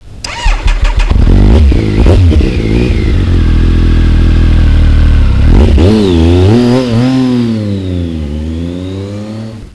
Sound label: Triumph Sprint RS 955cc In-Line 3 cyl engine sound; 2002; Section Six: The Soul of a Bike – The Engine